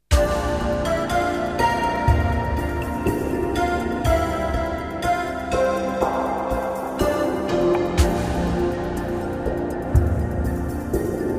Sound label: Jingles; 90 DDD Jingles